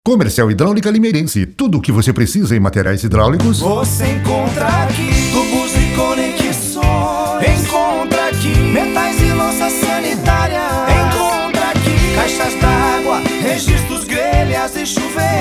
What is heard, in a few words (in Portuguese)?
jingle comercial hidraulica; limeirense